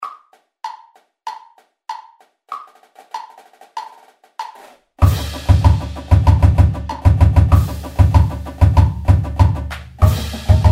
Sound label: fluid flams, Percussion